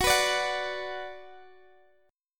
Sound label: Gb Suspended 4th Sharp 5th, Guitar DADGAD; x,21,21,19,21,x; down; chord shape